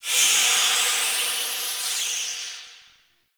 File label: Town Steam Release; Duviri